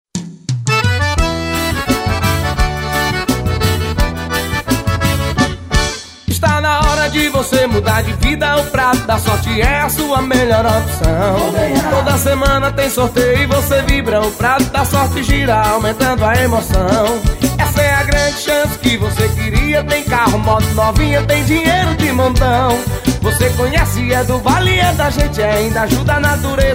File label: JINGLE; PRADO DA SORTE